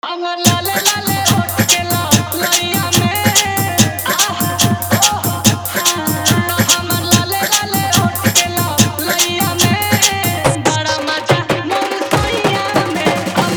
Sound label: Fully Dhollki Bass Mix